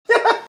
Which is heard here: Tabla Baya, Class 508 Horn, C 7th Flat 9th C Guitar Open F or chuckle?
chuckle